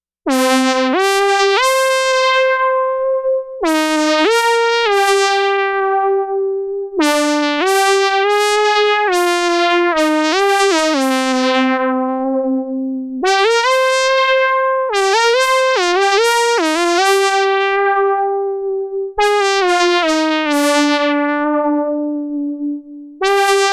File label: MOOG MAVIS Phase Folded Lead Dry Signal